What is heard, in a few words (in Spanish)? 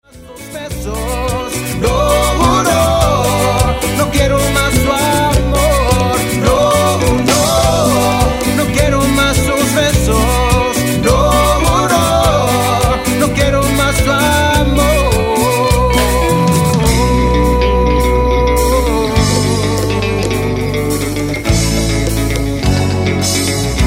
Cumbia